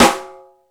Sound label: Rock N Roll, E-MU Pro-Cussion